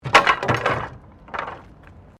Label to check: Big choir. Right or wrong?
Wrong